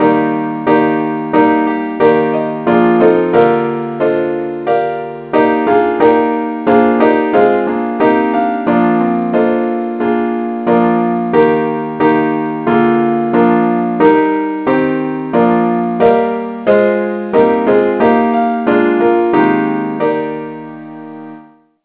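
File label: key e flat; saintthomas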